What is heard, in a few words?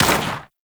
Fighter Rager Fire; Grineer; Space Fighter Rager Agent